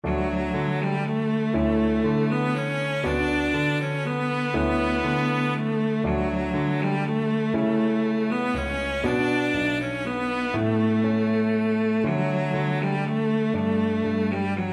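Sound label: violoncello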